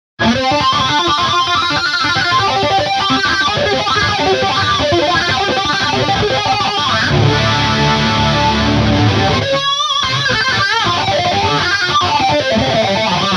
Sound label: solo, hellfire